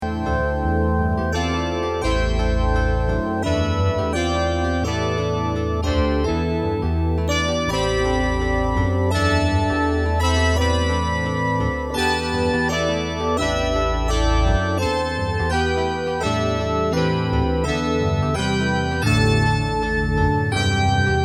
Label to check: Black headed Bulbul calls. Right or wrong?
Wrong